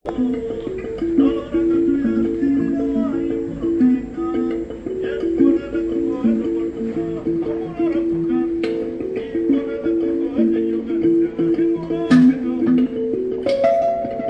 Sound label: kalimba